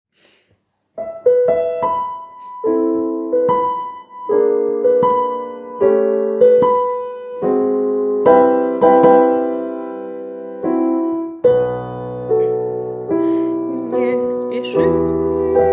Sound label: soprano